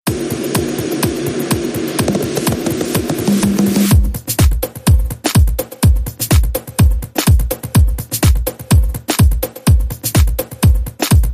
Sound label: Share Beat